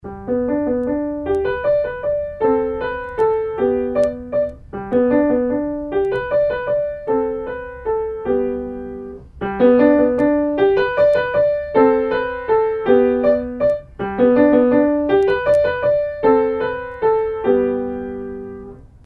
dutch dance, Pianist; Bastien

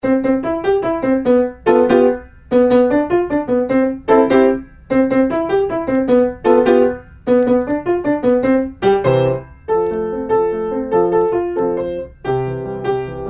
clap hands, Pianist; Level 2; Bastien

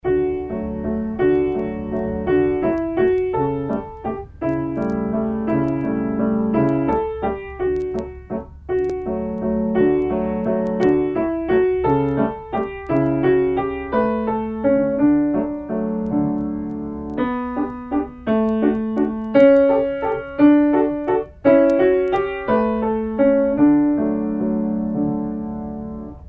german folk song, Pianist; Level 3; Bastien

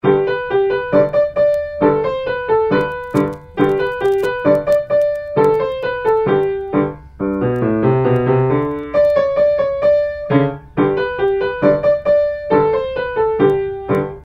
bugle call, piano; Level 1; Bastien

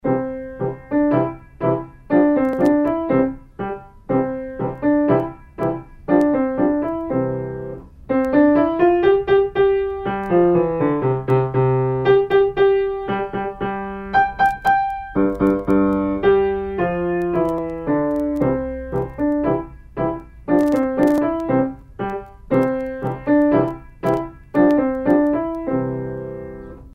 alouette, Pianist; Bastien

